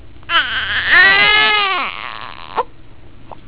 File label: cry